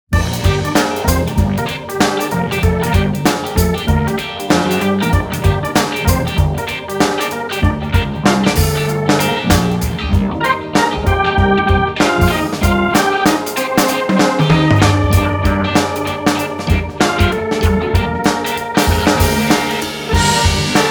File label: Wah Wah Blah; Fight'N Rage; ps4 ps5 switch windows xbox one xbox series xs gamerip 2019